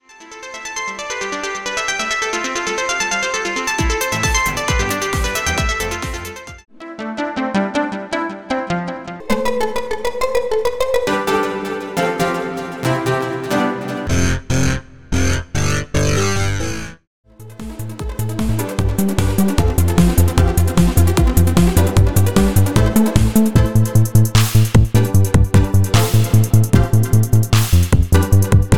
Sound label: Plucker Audio, plucker synth